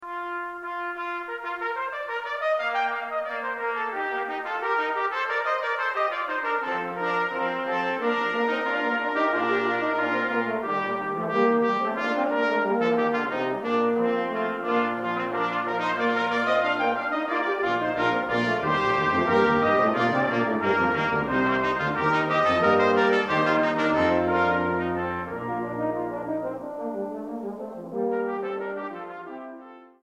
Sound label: canzona, brass; gabrieli